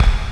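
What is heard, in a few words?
vox gate kick; RAP CITY 1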